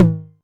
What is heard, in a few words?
Tom Hi, Novation Drumstation; DrumstationV1